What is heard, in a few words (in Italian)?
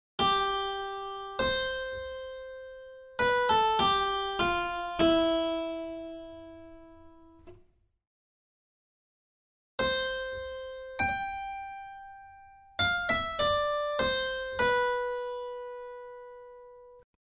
Tema e risposta